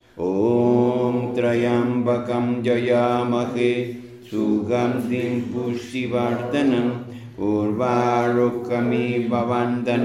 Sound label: Om Trayambakam Mantra; mantren